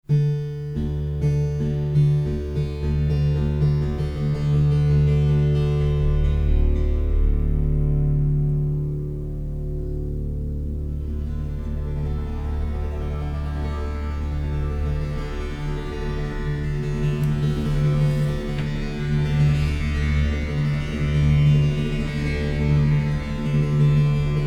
Klangliege Monochordstimmung d D DD

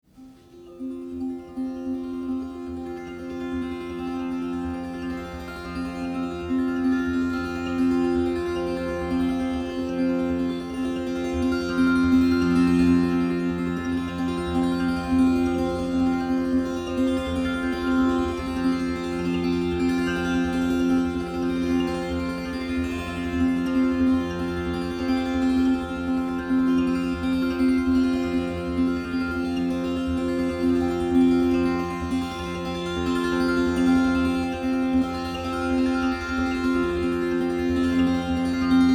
Tamburina Stimmung c f f f F

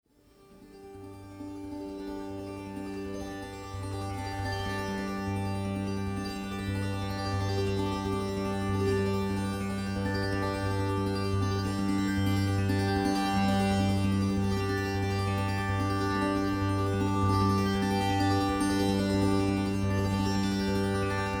Tamburella Stimmung d g g g G

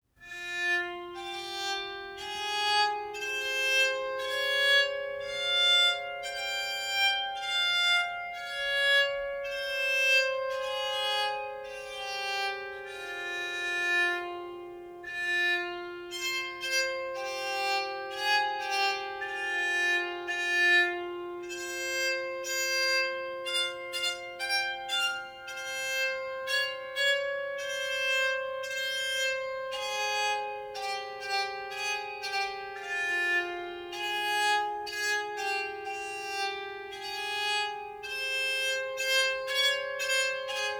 Tropfenpsalter mit Bronzesaiten in Moll Stimmung

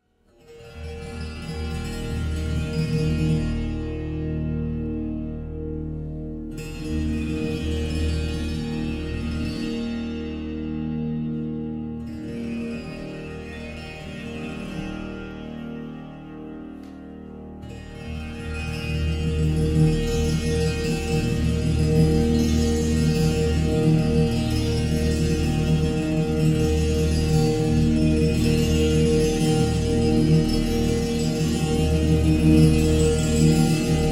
Bass-Körpertambura, Stimmung B – es – es – Es (EsEs), dynamische Spielweise; Körpertambura® (Body Tambura)

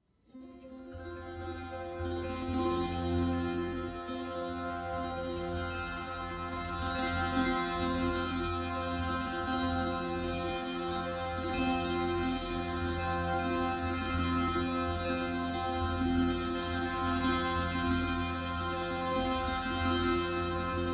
Tamburina, Stimmung c‘-f‘-f‘-f (F), stetige Spielweise; Körpertambura® (Body Tambura)

